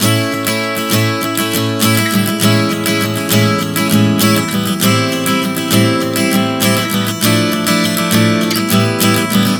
Progression 100 A-Am7-Dm7, Acoustic Guitar, Chord Progressions 100 bpm